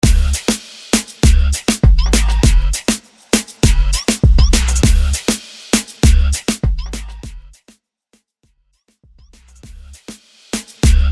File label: 90s Dancehall Bootleg; 100 Bpm